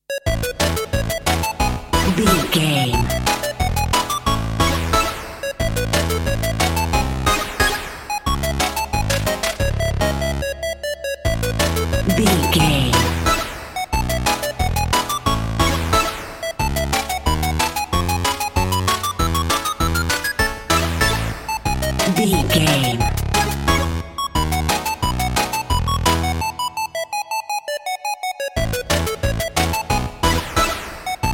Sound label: pizzicati chiptune 8bit retro ballet; delibes